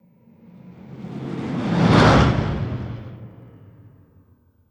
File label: Ship By Fast